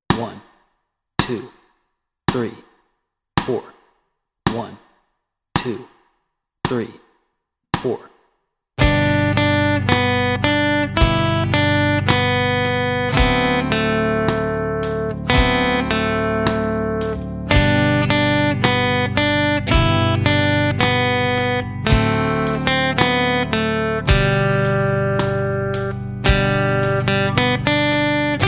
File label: Camptown Races Melody and Chords Track, guitar